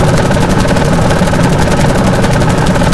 rev limiter; Kart; AOS RF1 Kart Demo

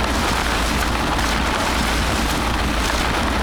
grass roll; AOS F1 2022

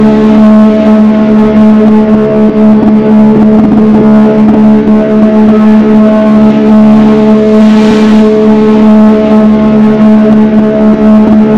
EX OFF HIGH, F1; AOS F1 2022; FSR2018

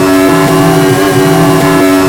V6T off mid; AOS F1 2022

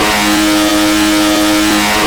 in CHIGH; Japan; AOS F1 2022

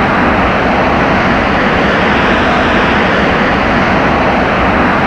ROADWIND OPENWHEEL, F1; AOS F1 2022

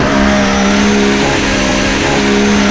Ferrari in; AOS F1 2022

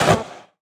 ex upshift; AOS F1 2022

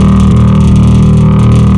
rumble; AOS RF1 Kart Demo

